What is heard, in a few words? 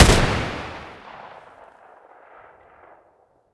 ash12 muzzle mono distant, weapons; STALKER; IWP